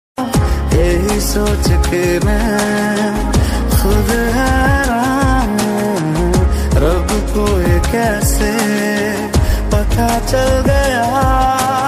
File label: Romantic Hindi Song Ringtone